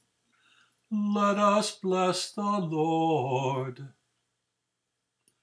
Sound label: Dismissal simple